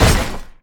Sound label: hand down; gundyr